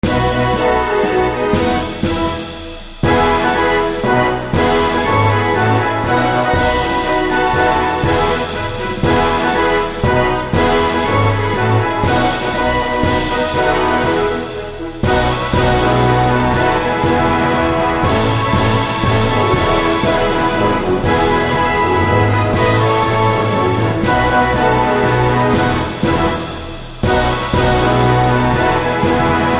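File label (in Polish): Hymn Polski instrumental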